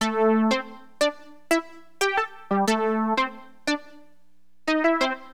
poly, keyboards; HipHop; LiveAct Pool 1

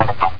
clop; Hexen II

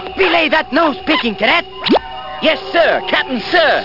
nose pick

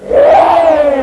Rat Death; Mythos

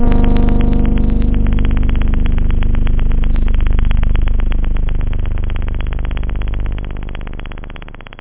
hi synth; da capo vol1

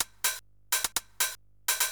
PERCLOOP HOUSE 125; eJay

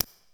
dial turn; Safecracker; BLUESAFE

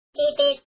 bg beep; screamin upstreamin

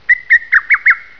bird; Computer Kids 1.2

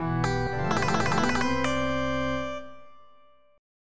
Shield

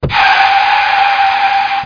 CAR CRASH; Road Trip Press Kit

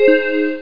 Pleasant Beep

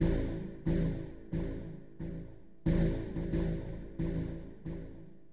SPOOK BS, HipHop Bass; LiveAct Pool

